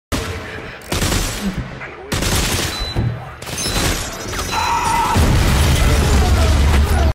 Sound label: guy explodes; dead space 3